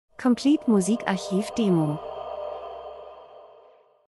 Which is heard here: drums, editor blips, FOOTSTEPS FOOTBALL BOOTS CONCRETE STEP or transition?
transition